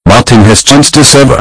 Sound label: heart attack